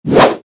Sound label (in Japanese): 剣を振る モノラル; 武器効果音